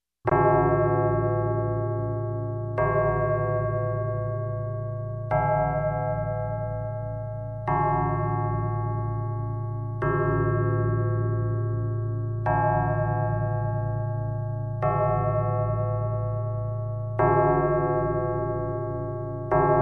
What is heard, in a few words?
Grandfather Clock bell alert